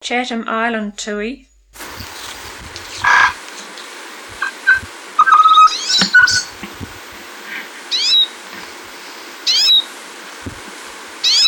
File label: tui, chatham